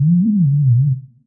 uncertain wobble hit; Weird and Interesting Hits; single hits

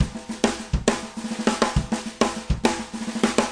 108.5 rolling around in 6-4; 101 to 110 bpm

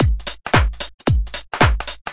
112 garage swing, drum loops and breaks

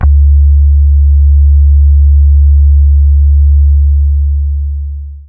harmonic subbass; Basses

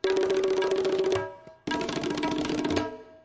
112 3-4 twotone tabla roll, drum loops and breaks

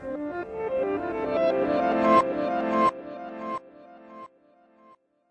backwards, Keys Organ Piano; MELODIC SAMPLES

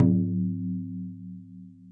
okedo A5, Japanese Drums; ETHNIC and WORLD PERCUSSION

